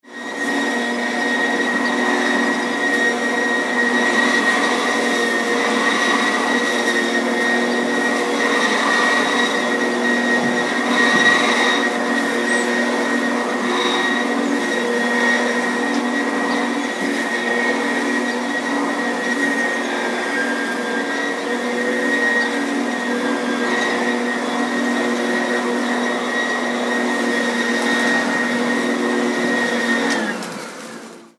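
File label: lawnmower bearings seizing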